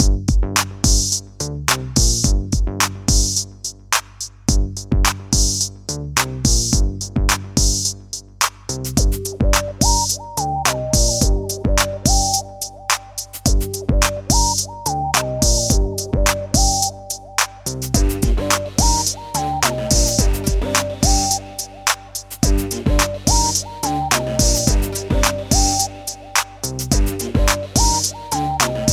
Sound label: Hip Hop